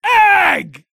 Egg

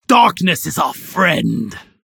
Bar Cheering when drinking

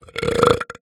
Dwarf Misc burp

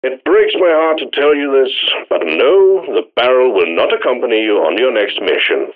Barrels Drop Pod

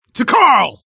Bar Cheering when drinking

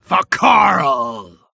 Bar Cheering when drinking

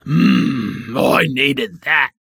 Bar Cheering When Somebody Orders Drinks

